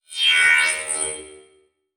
Transition Feedback Electronic; Adobe; Production Element

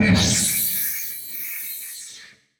Title Transition Burst; Production Element Title Transition

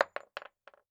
Weapon Bullet Shell 45 Dropping On Solid Wood; AdobeCC

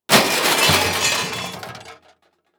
Crash Impact Car Crash Light Glass; crashes; AdobeCC

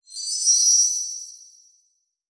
Digital; Adobe; Multimedia Internet CD-Rom Flash

